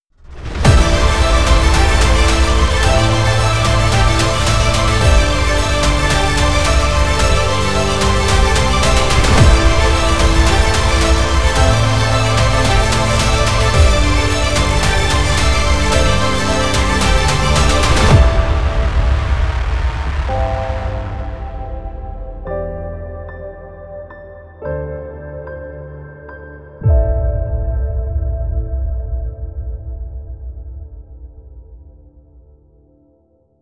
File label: intense trailer short